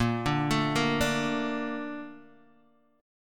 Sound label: Bb Major 7th, Bb, down, Guitar, DADGAD